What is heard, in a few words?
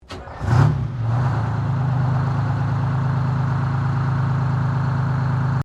Truck Kenworth 12V71 Ignition, Old Kenworth truck; 1969; Trucks; Vehicles